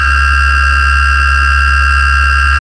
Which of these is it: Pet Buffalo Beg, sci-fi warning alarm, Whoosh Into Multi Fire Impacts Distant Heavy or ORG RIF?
sci-fi warning alarm